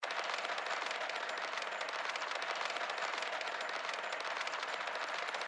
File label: Reel Spin; Outdoor America